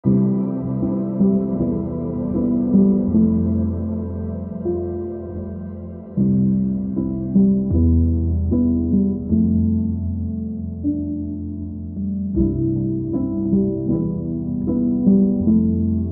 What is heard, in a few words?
Instrumental Version; Barbie soundtrack